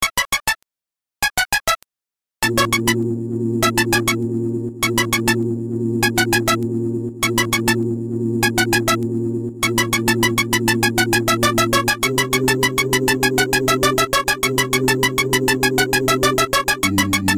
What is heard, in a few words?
cool melody